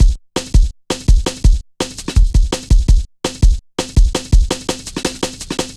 Rollin Shaker, Drum Loops 166.5; Zero-G Jungle Warfare 1, 2 & 3

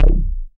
K-2000, Synth Bass

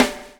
BUBBLE; Spectrasonics - Backbeat

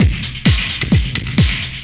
drum 130 techno; Techno Trance Essentials

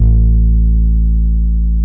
DUBBASS, Electric Basses; PlatinumPhattCD2; E-MU Producer Series Vol. 8 – Platinum Phatt

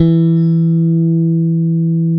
FBASS, Electric Basses

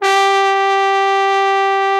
TROMBONE; Best Service Gigapack 1

